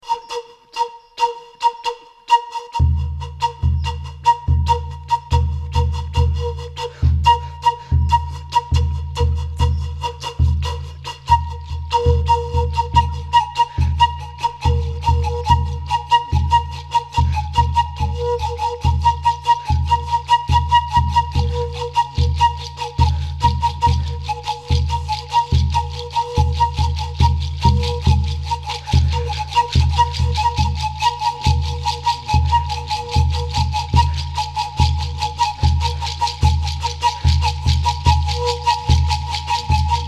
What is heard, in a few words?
PIFILKAS